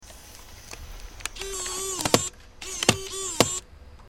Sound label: superdrive bork